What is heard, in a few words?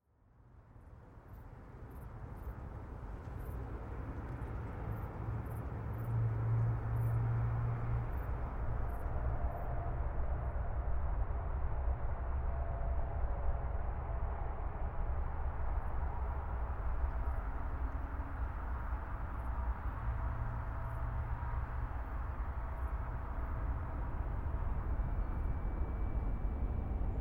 city ambience